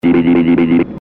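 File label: The Sound Of Robotic Buddy; Buck Rogers; Twiki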